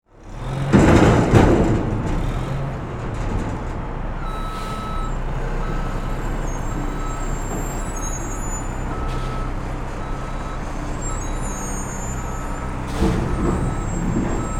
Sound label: Garbage Truck